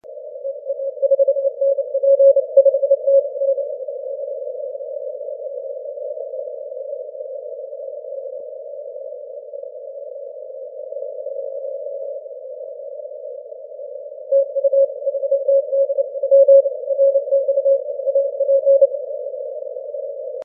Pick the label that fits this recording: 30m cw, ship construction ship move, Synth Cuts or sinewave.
30m cw